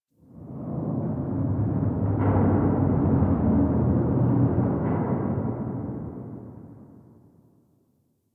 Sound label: Distant Eerie Metal Bangs; Warframe; Ambience; Nineteen Ninety Nine